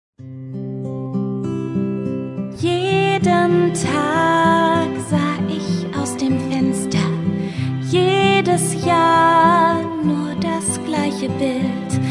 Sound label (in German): Gesang